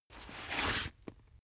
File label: boxing gloves slip off; sport freizeit; boxen